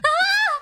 "あぁ", 情けない声

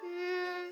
"んんー", 感嘆

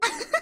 うふふ, 笑い声

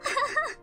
あははっ; 初配信

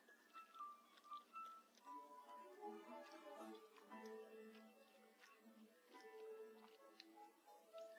咀嚼

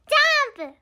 ジャンプ; ushiumi

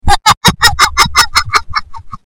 takawarai

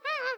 "んん゛", 情けない声

